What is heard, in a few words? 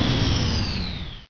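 hover stop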